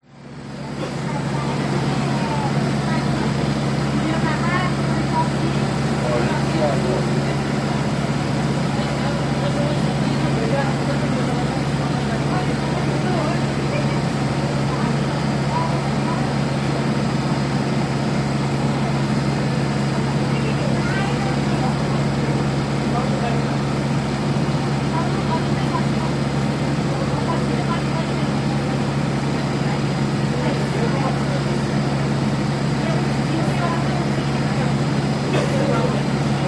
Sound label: bus interior idles walla people busy airport transfer bus; hamad international airport qatar